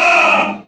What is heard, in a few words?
man pain; delugeman